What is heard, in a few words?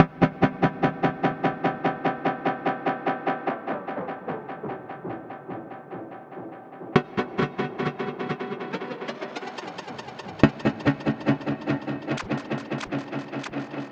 Bpm138 Chord Loop; Airy; Light; Prime Loops; Dubstep Illusions